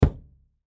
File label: Drum Bass Single, Drum Bass Single Kick Hard; Drum Kits; Blastwave